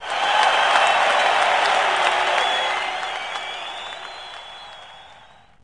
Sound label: CHEER; stardunk ios gamerip